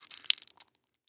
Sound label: guts squeeze wet liquid drip